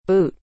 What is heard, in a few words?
boot